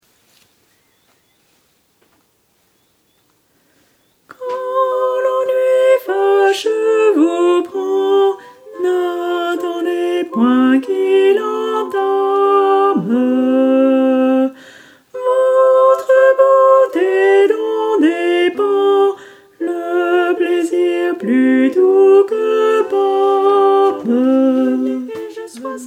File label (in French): basses; Quand l'ennuie facheux vous prend